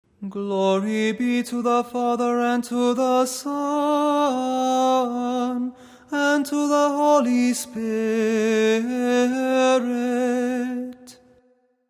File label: Tone 8 glory be